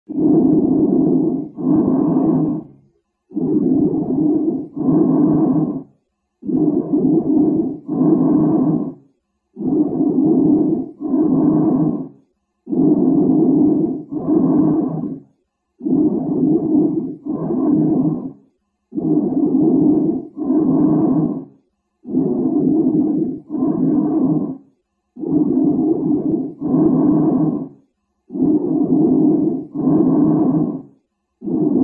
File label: Bronchial breathing sound